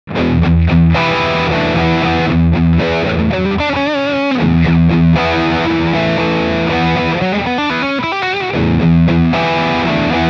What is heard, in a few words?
Full Gain Mid Tone Riff